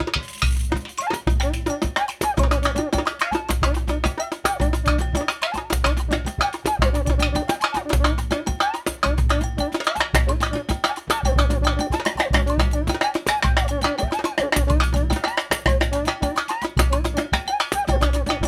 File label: RIO, Rio Batucada